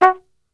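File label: F#2, flugelhorn, brass; Best Service; Brass Super Section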